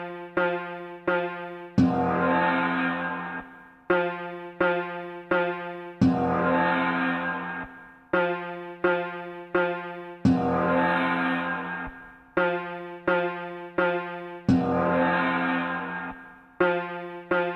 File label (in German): Gongspiel; Der Rhythmus-Globetrotter